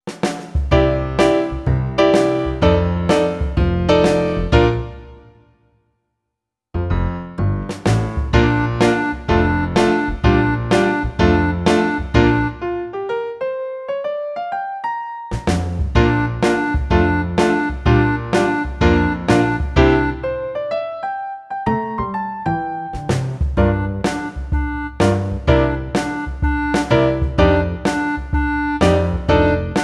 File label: blues